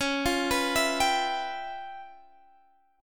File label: C sharp 7th Sharp 9th Flat 5th, C sharp, down, Guitar, Standard; arpeggiated